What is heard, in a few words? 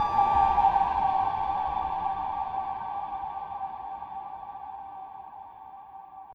Ambient Hit G; fx